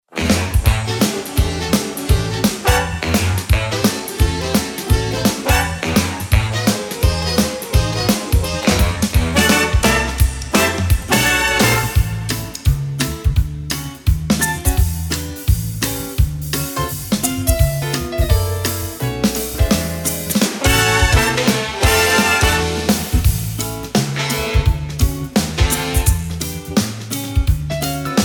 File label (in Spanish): sin coro; xmas show 2024